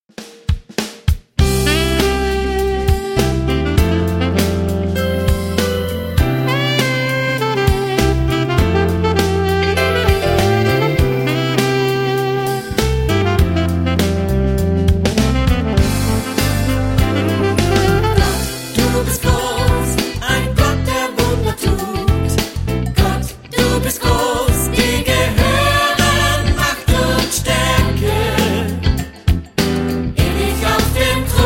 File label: Gospel Celebration Choir